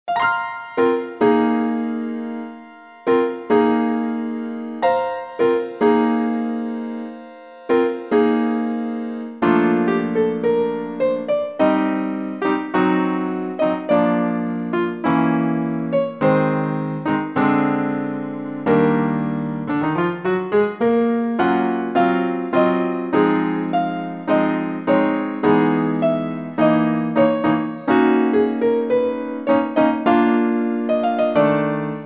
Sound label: piano